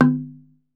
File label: TUNG LO, African Perc; E-MU Producer Series Vol. 7 – Old World Instruments